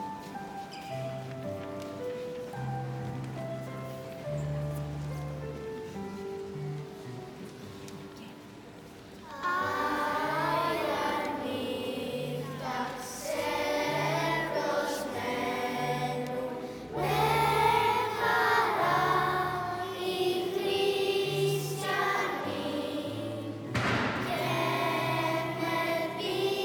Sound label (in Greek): Αγια Νυχτα Τραγουδι; Γ Δημοτικου